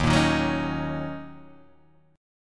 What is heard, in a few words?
D sharp Minor 7th